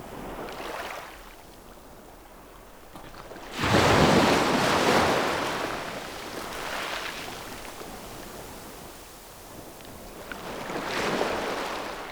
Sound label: waves